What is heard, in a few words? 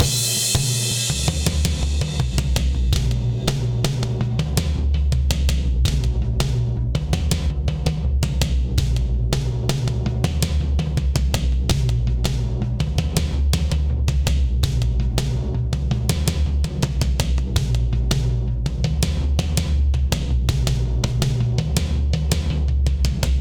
Toms Ambient Loud Punch 82bpm; Drums; Tomzlla; Ableton; Factory Packs; Session Drums Studio